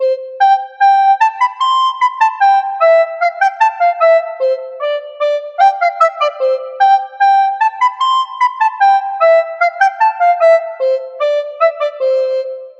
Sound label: march